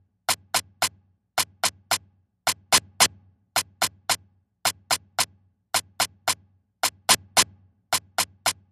Ambient House Clave 110BPM

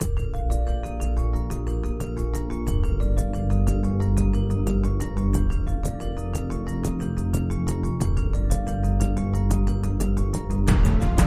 Alt Percussion

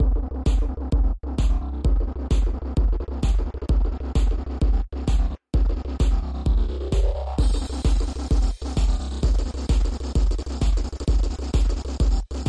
130bpm Alt Percussion

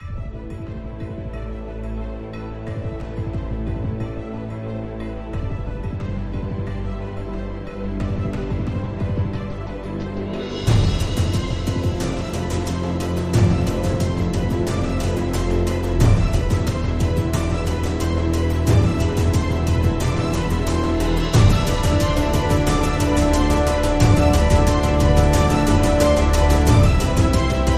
Alt Percussion

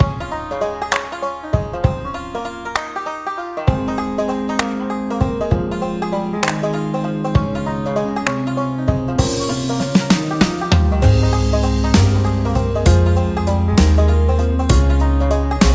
Romantic Lovers Walking Down a Country Track

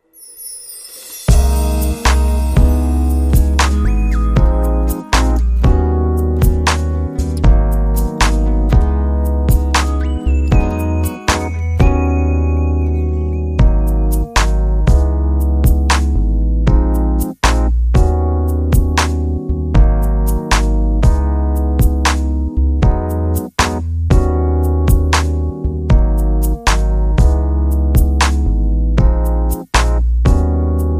sultry no lead or vox

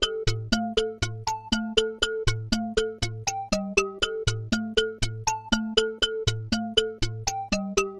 Ambient House Percussion 120BPM

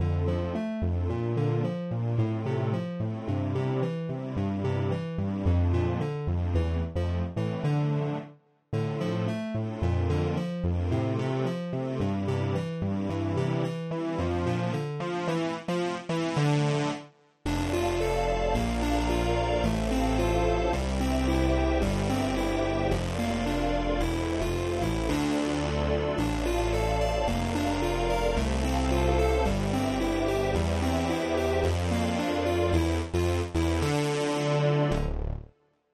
House Synth 110bpm

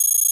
electronic device data readout short

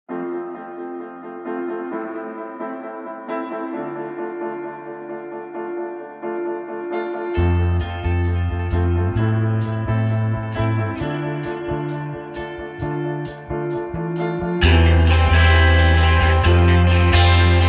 indie

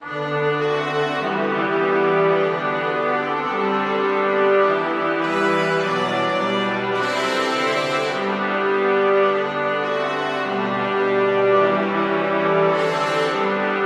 once in royal davids city brass band

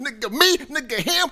Rap Vox

